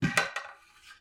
billiards pool sports sport cue stick setting against pool table; sonniss gdc